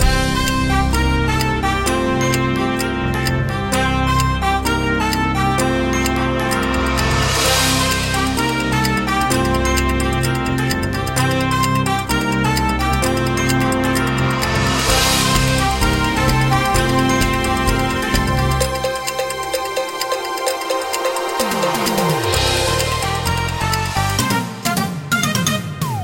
Countdown Guitar Music; Ringtones

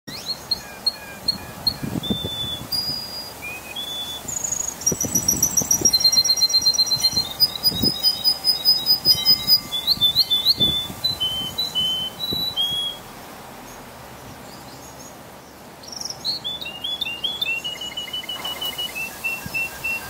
Canary, Bird Songs

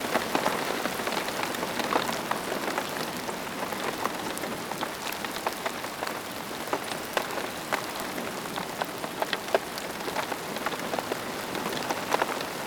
Rain Sounds, Camping In The Rain; Nature

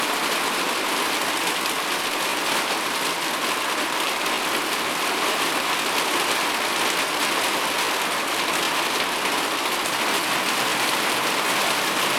Rain Sounds, Sheltering from the Rain; Nature

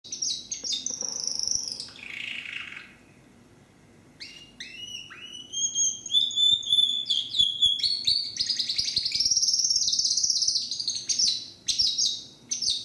Canary, Bird Songs

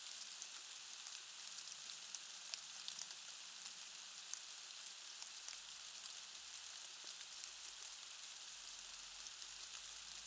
Rain Sounds; Nature

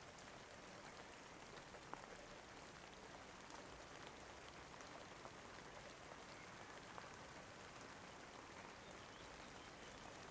Rain Sounds, Relaxing Nature Sounds

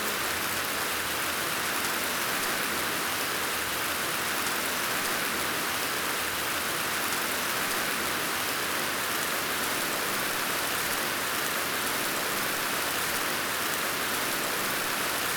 Rain Sound Ambience; Nature

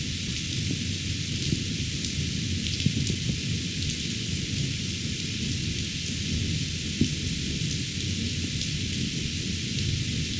Rain Sounds, Shower Raindrops; Nature

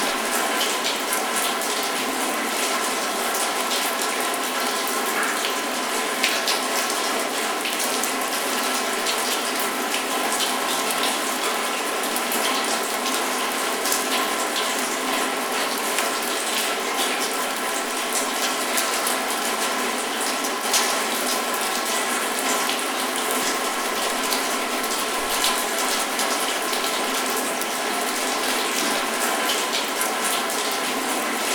Rain Sounds; Nature

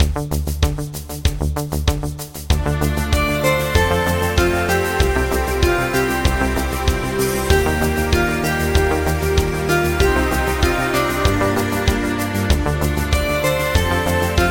GuitarMusic; Ringtones; Miami Vice

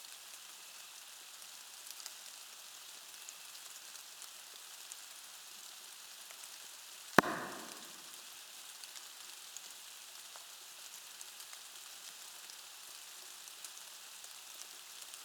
Rain Sounds, Crickets Singing in the Rain; Nature

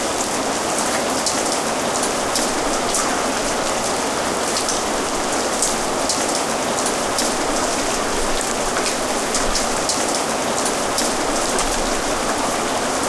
Rain Sounds, Rain to Fall Asleep With; Nature

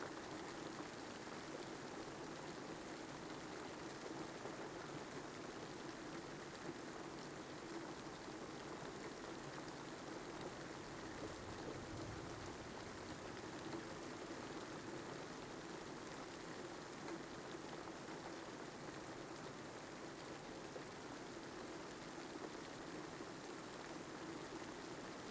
Rain Sounds - Listening to the Rain from Shelter; Nature

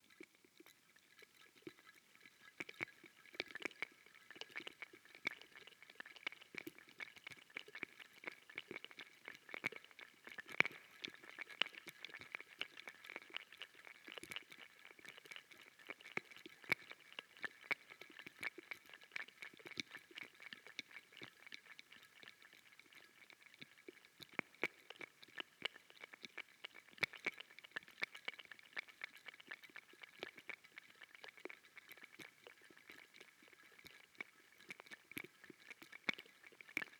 Rain Sounds, Tender Droplets; Nature

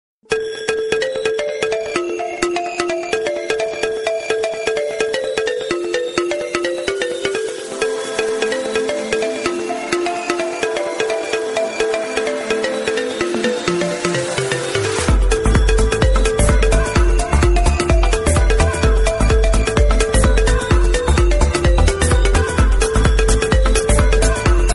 iPhone 5C GuitarMusic; Ringtones

